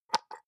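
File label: soap dispenser press almost empty soft, bathroom; haus-garten; badezimmer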